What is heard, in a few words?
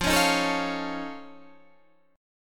F Augmented 7th F Guitar Half Step Down, chord